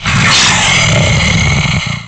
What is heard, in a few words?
Hissy Growl; Dogadon